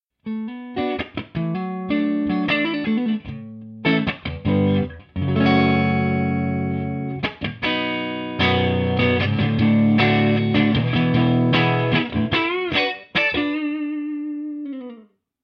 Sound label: clean